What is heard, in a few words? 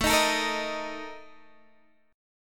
A sharp Major 7th Suspended 4th A